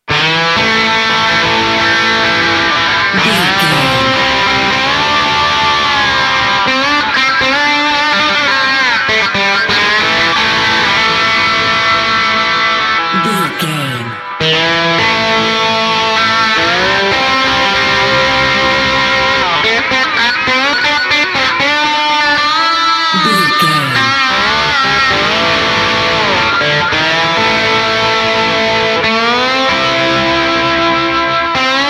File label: wrong turn trouble